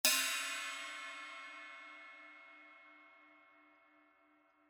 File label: 18 a zildjian china low